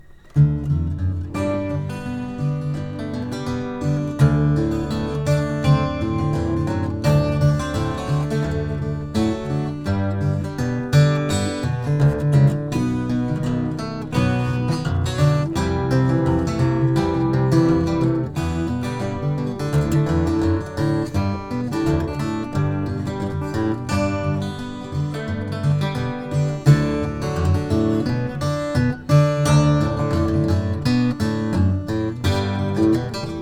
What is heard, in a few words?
freight; Gibson B45-12, with Heavy Elixir strings, tuned down a fourth